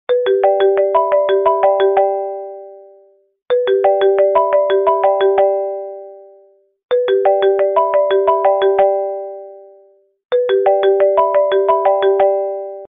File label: ringing sound